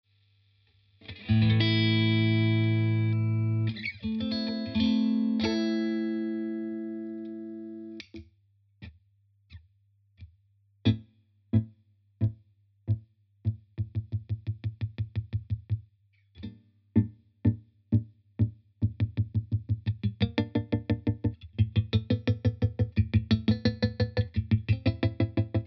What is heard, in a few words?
Crazy Tube Circuits Stoned Hz